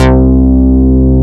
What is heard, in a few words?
MOOG BS; MINIMOOG; Greytsounds VOL-1